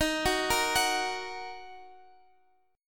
Eb Minor, Guitar Drop D

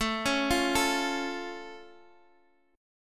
A Augmented A, Guitar Half Step Down

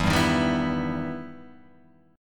E Minor Major 7th E down

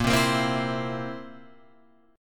Bb Suspended 2nd Flat 5th Bb down Guitar Open F; strummed

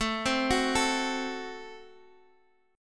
A Minor 6th A, Guitar, Open D

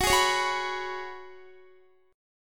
Gb Suspended 2nd Suspended 4th, Guitar; strummed

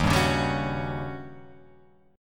Eb 7th Sharp 9th; strummed; x,x,1,0,1,2,3,3

